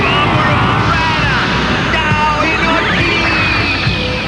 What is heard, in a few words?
cry